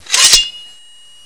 sword deploy; cstrike; zpl; knifes

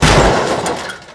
bump hard, car

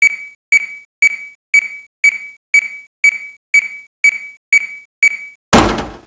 beep loop; multimod

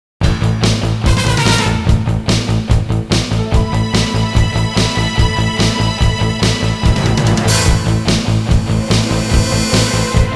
music multi; zombie escape

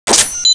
radar

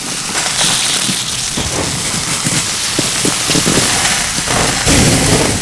spitter acid loop; cstrike; l4d2

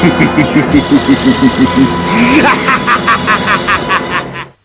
freezer; ttt drunks; cstrike

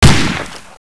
ak47; cstrike; ZP CRISTIAN; ZP WEAPONS

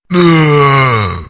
zombie die

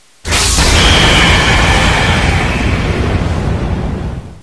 transformation; rumbling; ze rumbling

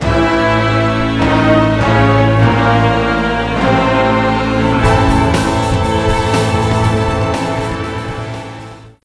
Music Rescue End; bog nomercy

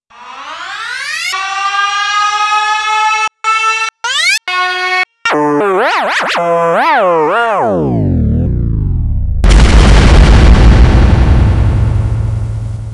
siren

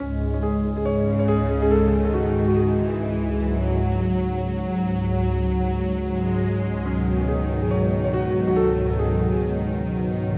Save Room Music

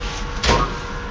button; cstrike

